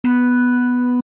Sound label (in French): musique